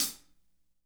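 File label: hat closed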